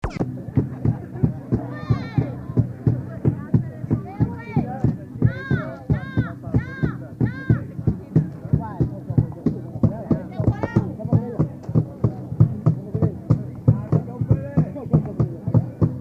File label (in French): choeurs, wallis futuna; sigave